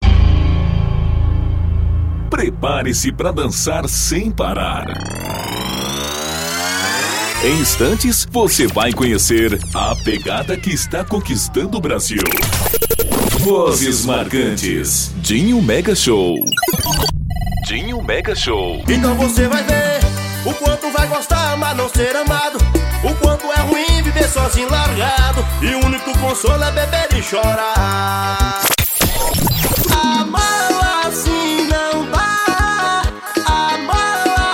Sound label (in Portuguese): abertura vinheta